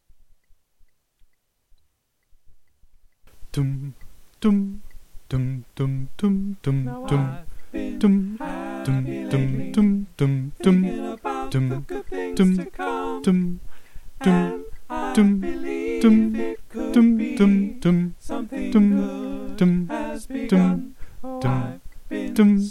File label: bass